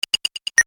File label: beep